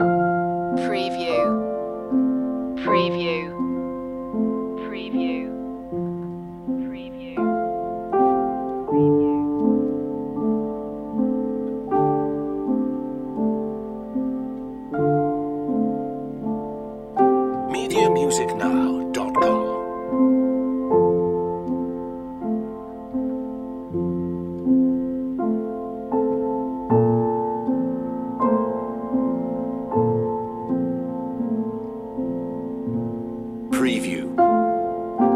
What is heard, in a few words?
summer afternoon loop